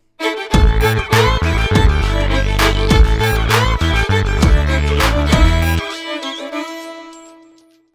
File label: Musicblock